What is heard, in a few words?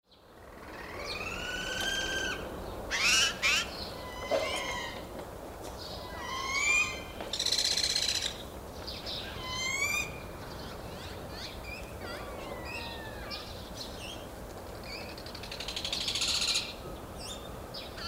onaga mating